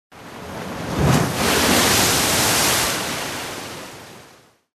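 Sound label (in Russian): мощная волна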